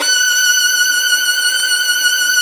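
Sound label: VIOLIN VIBRATO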